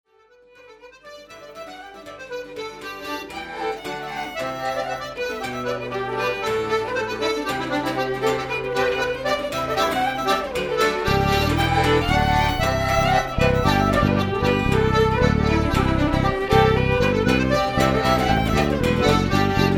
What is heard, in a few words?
bass